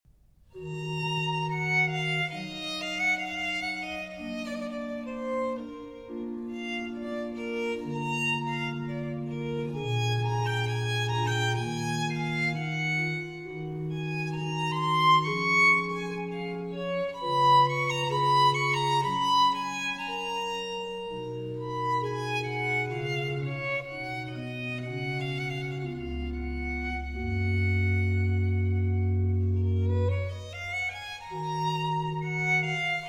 Concerto in A Major For Violin & Strings; RV335 - The Cuckow

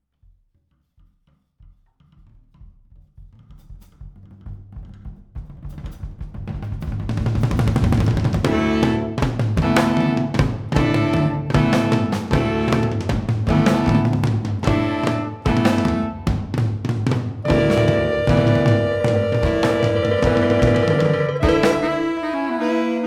Rustic Ballad

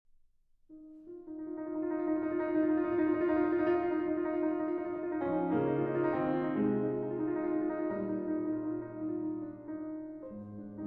Waltz in A-Flat Major

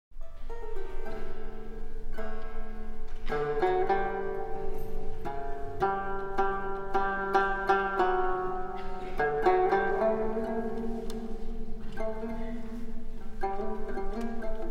Taqsim and Narration

